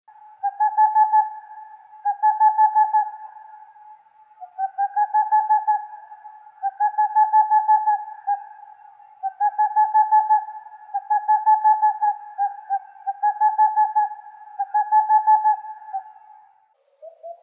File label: Aegolius funereus